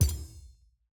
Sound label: button thumbnail click; champ select